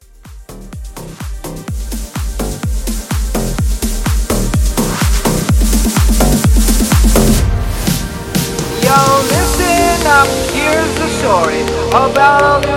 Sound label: House Blend